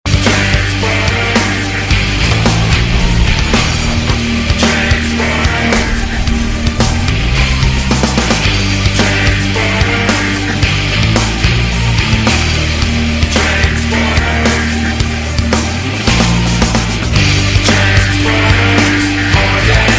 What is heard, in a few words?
theme song music ringtone; transformers; english ringtones